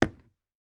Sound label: footsteps wooden floor; 足音